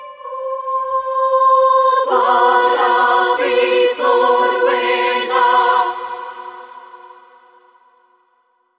Choir

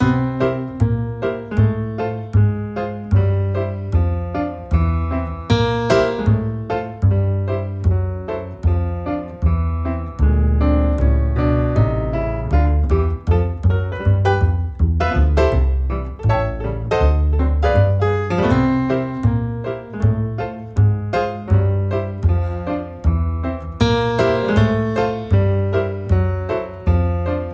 Honky Tonky, No Drums

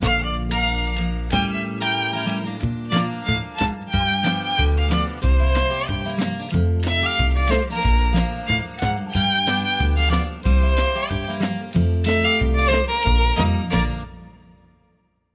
Steamboat Two Step

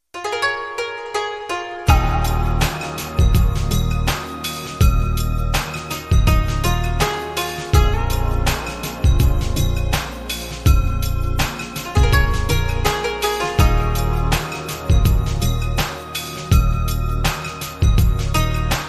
Koto Ground Beat

